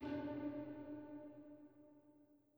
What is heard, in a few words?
chime old; chime sharp